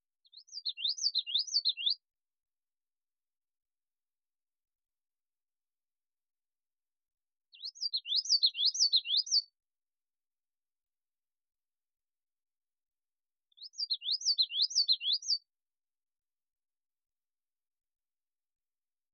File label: Yellowthroat, Ontario Nature